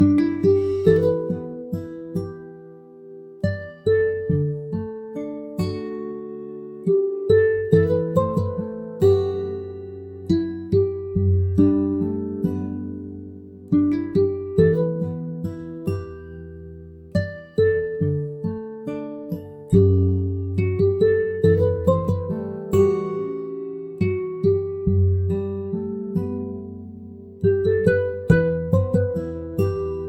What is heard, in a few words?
campfire cozy